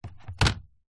technology computer laptop apple mac set down wood